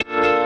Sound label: D STAB, Funky Underground